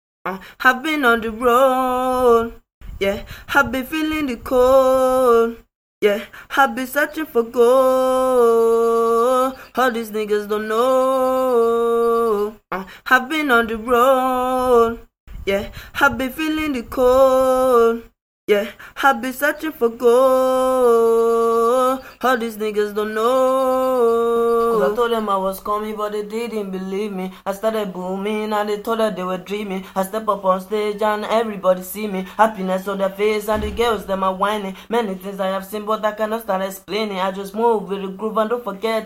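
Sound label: hip hop rapsing 83 Am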